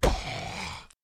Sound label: zombie hit